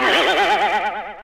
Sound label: boost